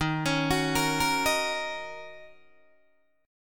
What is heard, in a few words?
D sharp Minor 7th, Guitar, DADGAD